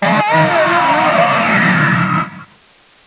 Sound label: zombie alert; svencoop